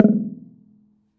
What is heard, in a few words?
Prepared Drums